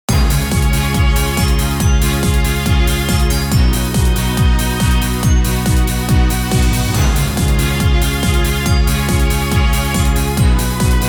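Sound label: Pops, BGM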